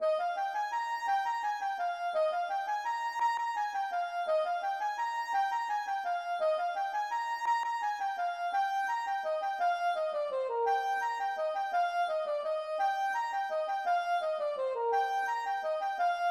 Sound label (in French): ridee Trad